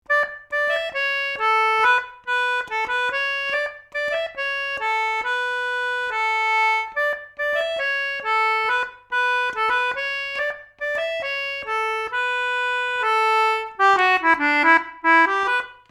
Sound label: polka, concertina; seaside